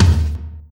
tom, drums; virtual drum sounds; custom drums

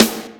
snare, drums; virtual drum sounds; custom drums

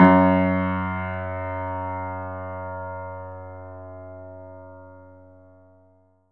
virtual piano B3

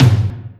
tom, drums; virtual drum sounds

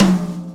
tom; drums; virtual drum sounds

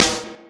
snare, drums; virtual drum sounds; custom drums

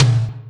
tom, drums; virtual drum sounds; custom drums

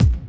kick; virtual drum machine

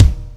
kik; virtual drum sounds

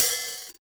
open, virtual drum sounds

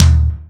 tom, drums; virtual drum sounds; custom drums

